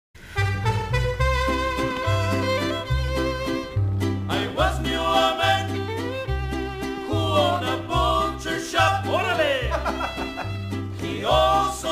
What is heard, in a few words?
Mariachi Band